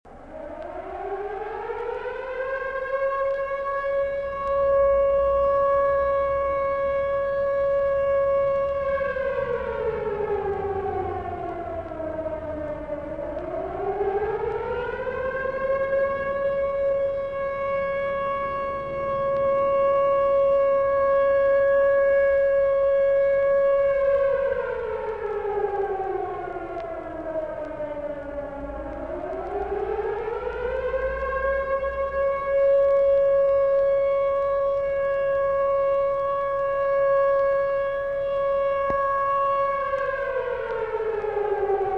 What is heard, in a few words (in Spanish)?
alarma